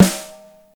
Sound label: Verby Acoustic Snare F# Key, snare drum sample tuned to the F# note